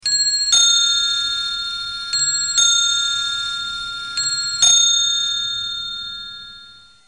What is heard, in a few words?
bim bam